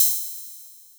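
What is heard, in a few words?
hi-hat open, Vermona DRM2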